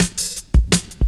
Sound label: reece steppa cut, drum loops; Zero-G Jungle Warfare 1, 2 & 3